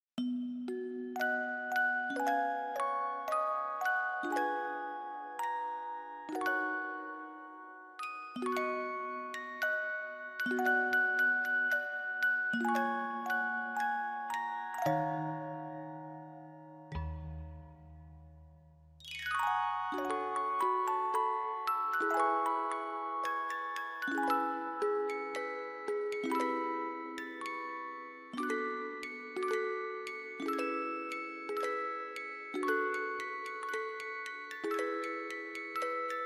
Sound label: music box ver.